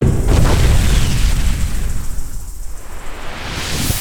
Last Blast; Sorcerer

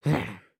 Walk; Lego Strombie; build mode

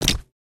brick no connect; build mode

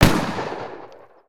Musket, weapons; aronwk collection; ndaudio

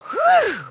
stink

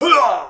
grunt; creatures organic

